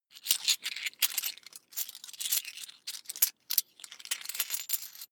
coins big clatter long

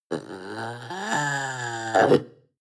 panther young snarl

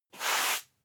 broom sweep hard slow

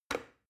plastic button start push regular

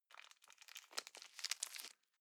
plastic bag small pocket pull out soft

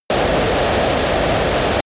bomb fly fall

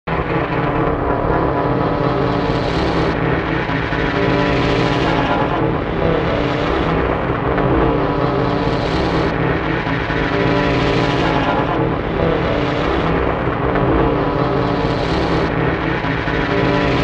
granular cluster

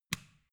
switch small turn on

